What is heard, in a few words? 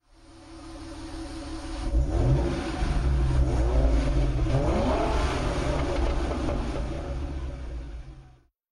mustang v6